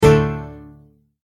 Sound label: Multimedia Tone-Stop; Tone Sound Effects; Tones (Sound Effects:Sound Design); McKinney Sound